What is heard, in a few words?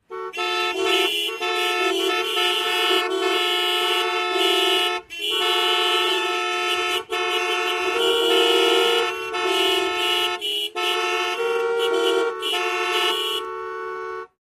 Traffic Jam Group Car, big city